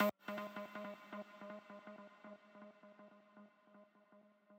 arp

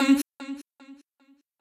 voice

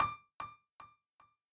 piano sabi

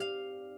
harp

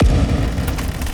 fx cinema

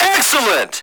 SE GAME SLIDE; maimai

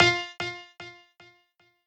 piano

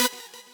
synth

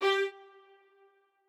strings

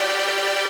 lead

